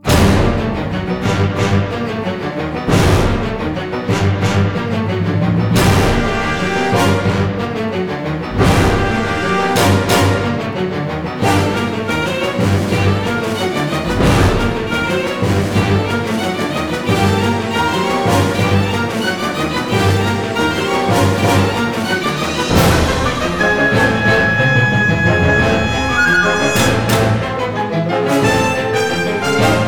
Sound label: Preludio Percussivo